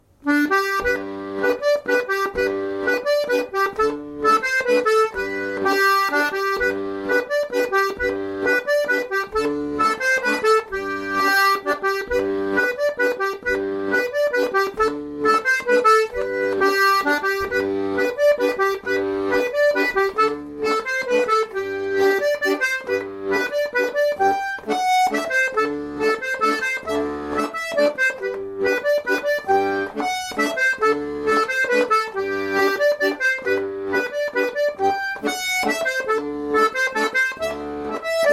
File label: mazurka; Accordéon Diatonique